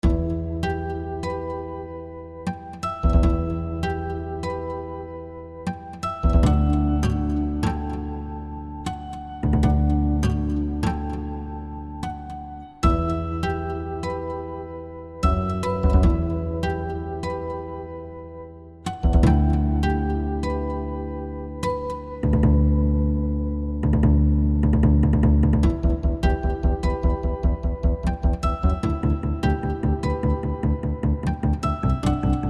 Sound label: guitar awesomeness